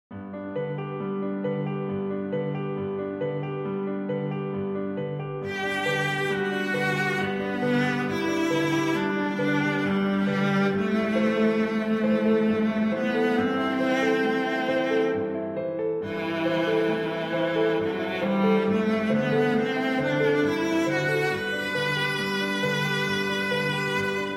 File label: the swan viola piano; saint saens; carnival of the animals